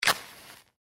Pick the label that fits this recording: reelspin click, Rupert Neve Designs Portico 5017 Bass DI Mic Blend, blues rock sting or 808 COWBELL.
reelspin click